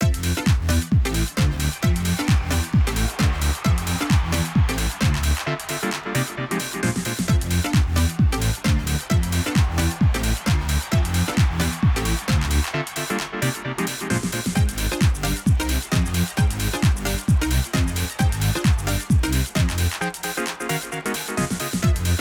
Pingpong BGM Entrance

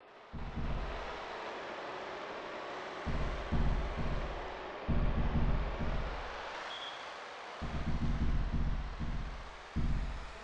Crowd Ambience Warmup; koushien 3 1994 snes

